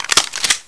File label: glock clip in, weapons